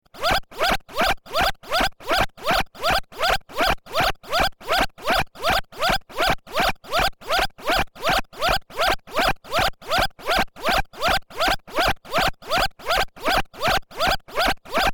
Warning Horn Warfare Industrial Sci Fi Control Room, Warning Beeps Inside Control Room; Control Room Warning System Or Alarm; Scifi Science Fiction